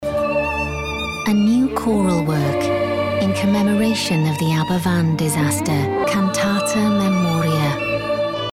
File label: Choir